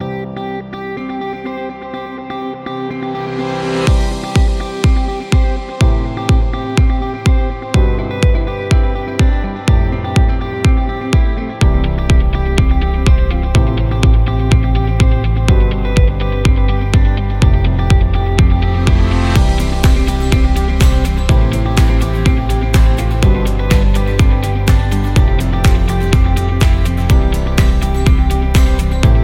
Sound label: Dynamic